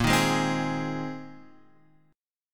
A sharp 7th Suspended 2nd Suspended 4th, guitar chord; strummed